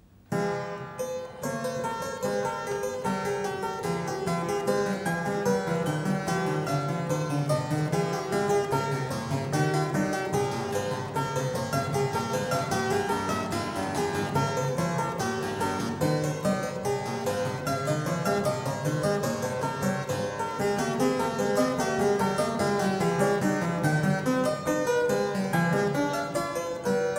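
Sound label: Prelude 14 in F sharp minor; BWV 859; Bach - WTC - Tilney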